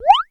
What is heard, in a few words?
ZIP, cartoons